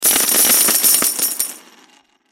Coins Drop Multiple, Coins Drop Multiple Pirate Gold; Coins Drop Multiple Pirate Gold Pile; Money; Foley; Objects; Blastwave